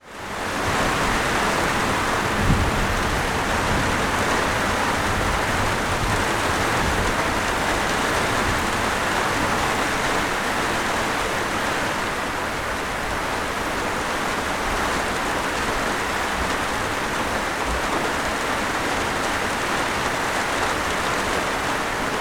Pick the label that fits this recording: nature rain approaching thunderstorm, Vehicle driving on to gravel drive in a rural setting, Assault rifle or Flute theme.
nature rain approaching thunderstorm